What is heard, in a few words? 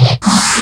fx scifi; Ueberschall; Techno Trance Essentials